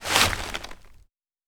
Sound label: Leaves